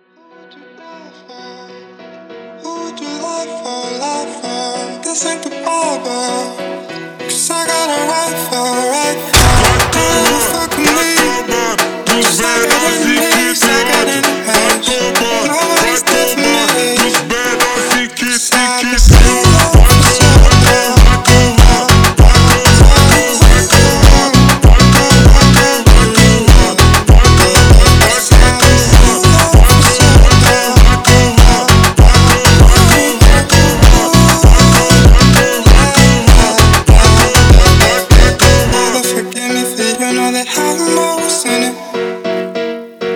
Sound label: Funk slowed